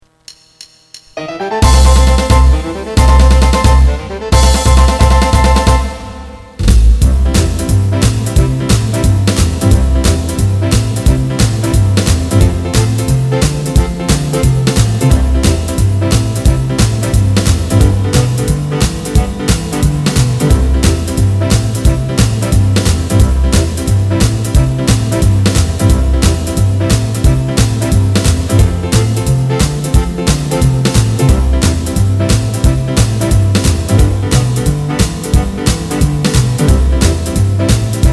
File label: background music